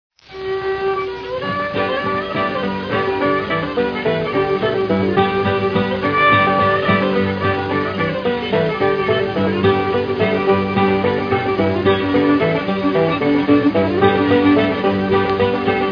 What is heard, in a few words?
stringband